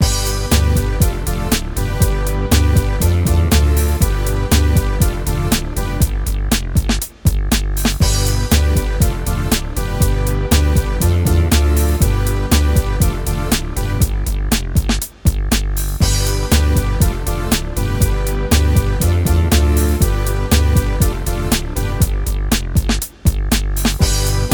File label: thinking time